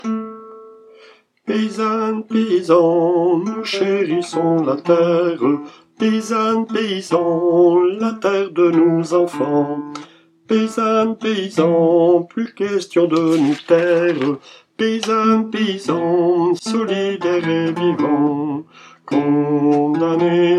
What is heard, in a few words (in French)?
paysans alto; repertoire chorale